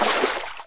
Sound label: water movement; Spearhead Demo; MacFormat 2003 June